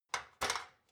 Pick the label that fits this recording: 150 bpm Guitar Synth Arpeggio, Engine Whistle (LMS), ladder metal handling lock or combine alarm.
ladder metal handling lock